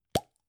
gum plastic box open

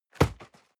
box hit hard bounce

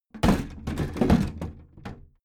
big plastic case lid put down heavy

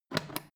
foot switch turn on off quick

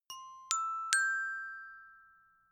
notification glockenspiel triad up major

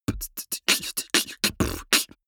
beatbox sequence open

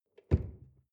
drawer large close lock

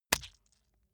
leather impact mud hard squelch

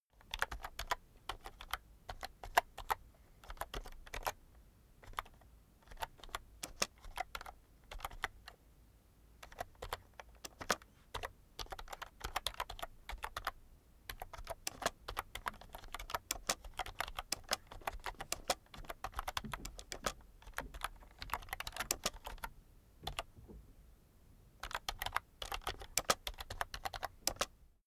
keyboard external type sequence slow soft

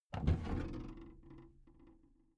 big plastic case lid lift soft

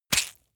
leather impact mud medium squeeze

